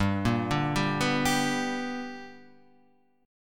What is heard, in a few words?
B Minor Major 7th Sharp 5th